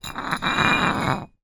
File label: foley glass bottle drag scrape concrete ground; zapsplat